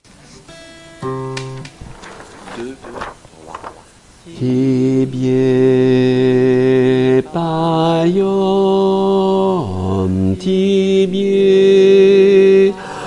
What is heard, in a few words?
BASSES, chorale; Tibie Paiom